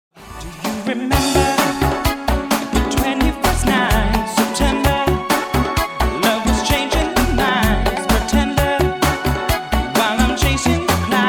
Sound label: Cover Band Medley; Miami; This Miami Cover Band has been keeping everyone dancing for many years